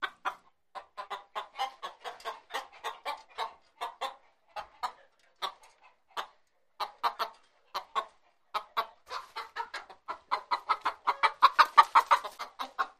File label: Chickens Cluck Squaw